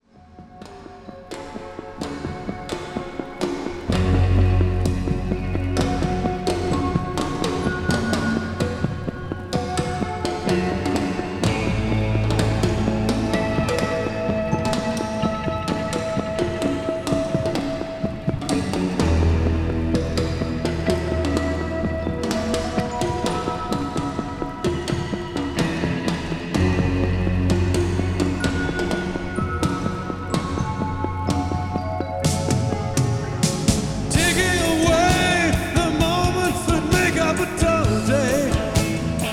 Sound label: analog 96khz; teac tn 100